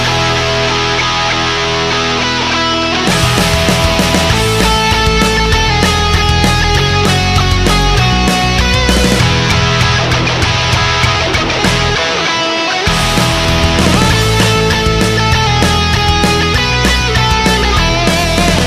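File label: Merry Metal Christmas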